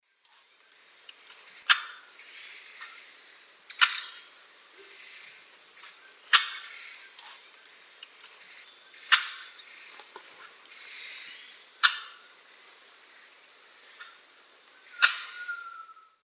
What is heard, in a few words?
Psarocolius decumanus, call; S. America; Eastern Lowlands